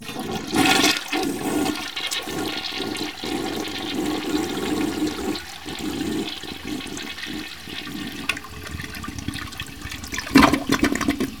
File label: toilet; Porcupine Productions